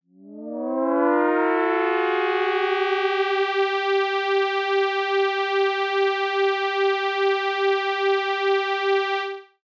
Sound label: Lead; PatchFactory